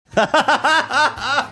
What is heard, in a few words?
laughing